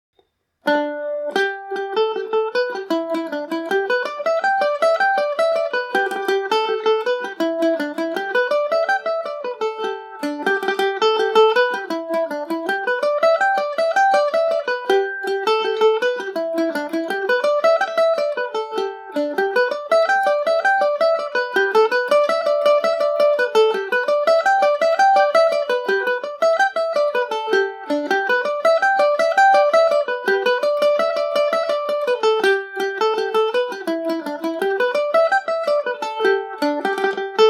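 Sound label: G major, irish mandolin; The Haunted House; Kentucky KM1000 mandolin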